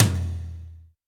high tom single hit f key, Royality free tom drum tuned to the F note. Loudest frequency: 2418Hz; tom drum samples